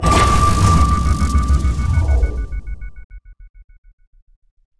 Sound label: Result Eliminated Game Over